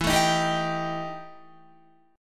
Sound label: E Minor Major 7th Double Flat 5th, Guitar; strummed; EmM7bb5; 12,12,13,12,15,14; 4E,4A,5D,5G,5C,6F